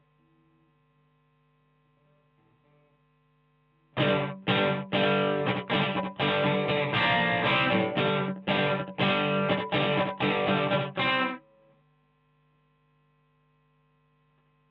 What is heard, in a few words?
GTR